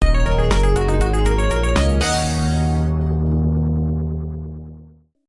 Level Complete; Azangara

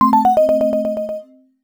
Coin; x68000; Space Harrier

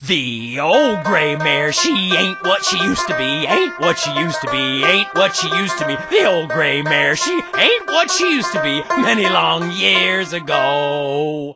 Banjo; out from boneville; Old Gray Mare

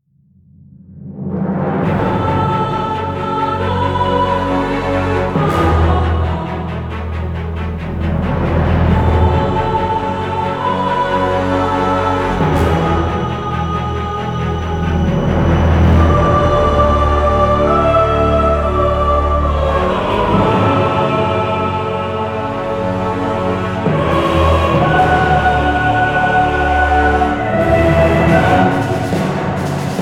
Menu Music; RLK

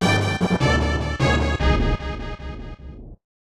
Player Down (Orchestra); Star Fox; SNES

